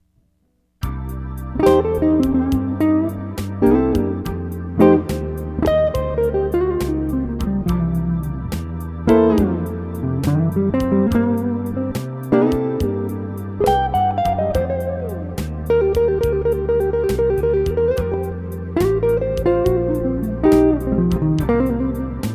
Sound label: blues